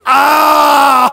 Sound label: aaaaaaah